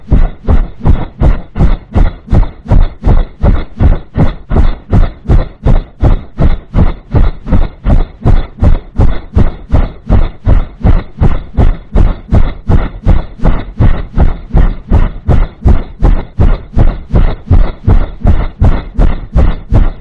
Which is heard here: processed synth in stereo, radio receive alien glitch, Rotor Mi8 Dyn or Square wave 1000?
Rotor Mi8 Dyn